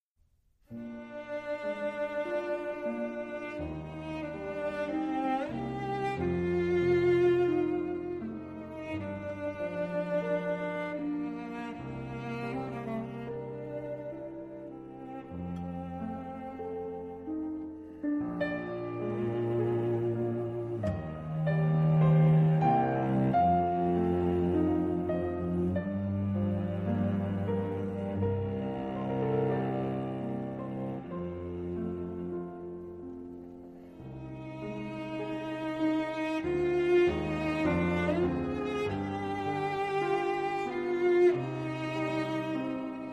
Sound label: Cello Sonata In G Minor Largo; Chopin; Op 65 3